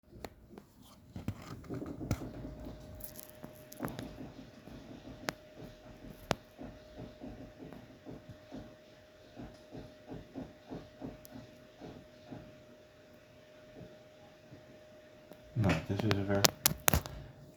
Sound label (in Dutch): Drummen